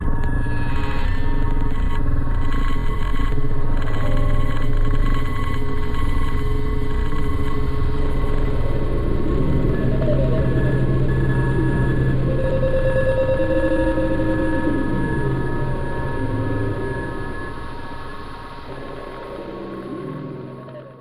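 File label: Interference, A minor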